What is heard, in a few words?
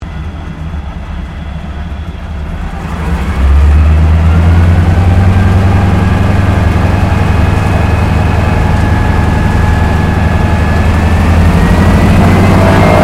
AIRCRAFT AT-6D HARVARD MK III PREFLIGHT INTERIOR STEREO; Temora Harvard MK III; Airplanes; Sound Effects:Vehicles